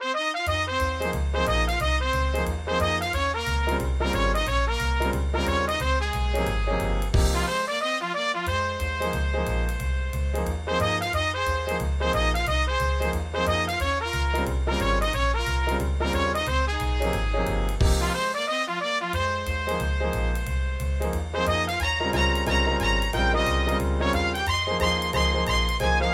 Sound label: Jazz